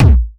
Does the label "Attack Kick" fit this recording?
Yes